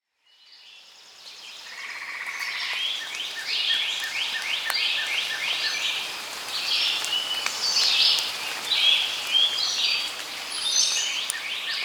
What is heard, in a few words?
Monkey Crowd; Pitch Black Audio Pong